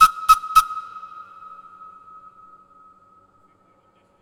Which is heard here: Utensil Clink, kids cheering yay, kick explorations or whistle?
whistle